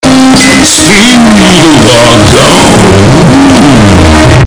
earrape; speeeedwagooon

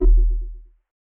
invalid sound; r place 2022

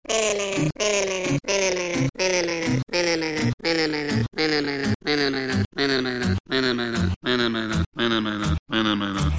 chromatic descending

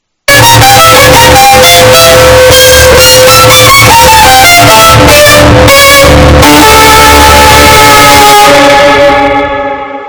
violin loud

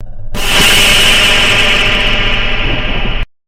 jumpscare; roblox

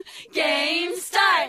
game start

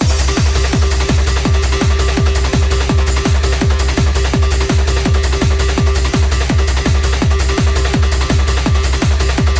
hardcore trance

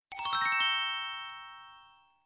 magic

